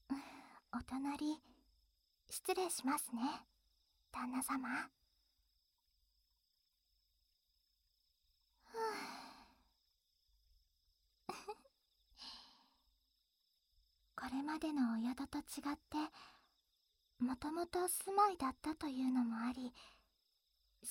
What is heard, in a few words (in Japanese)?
両耳かき; 新しい宿と両耳かき; 01昼